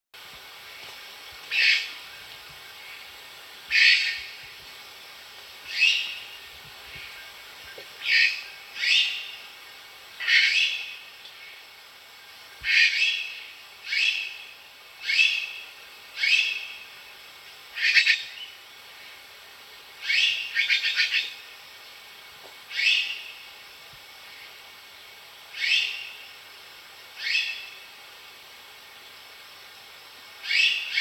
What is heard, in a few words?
white winged magpie